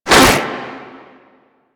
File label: Infested arm attack; Enemies; Warframe; InfestedNinetyNine